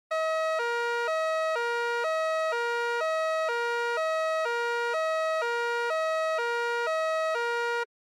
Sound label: Police Car Siren Dry